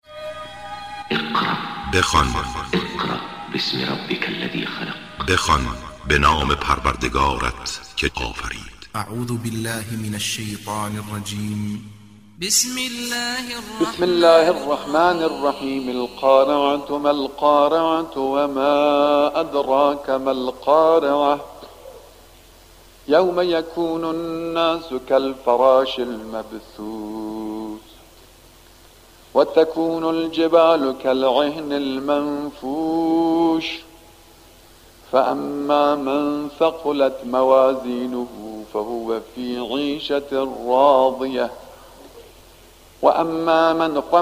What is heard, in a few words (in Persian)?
تلاوت سوره قارعه